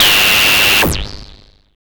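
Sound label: FX right, attacks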